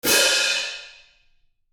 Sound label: Sabian cymbal crash medium